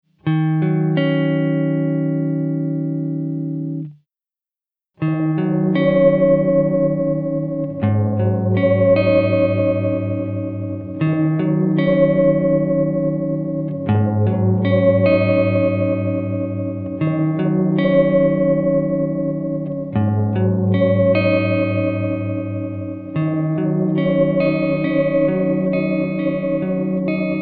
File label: filter float around